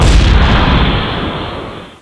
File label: Explosion Napalm; Army Men TiS